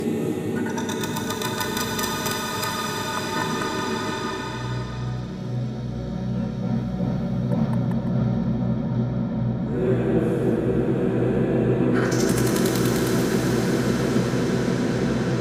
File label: ambience clarity and definition